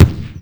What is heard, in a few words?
Explosions Explode Water; DCS